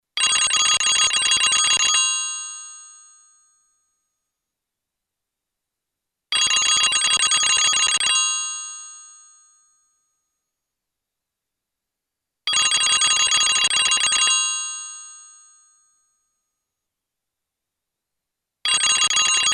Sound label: Old German Phone; old phones